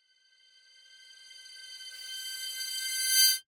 Piercing Riser, Harsh, Metallic, Layer, Creepy, Horror; 344 Audio; Supernatural Ghosts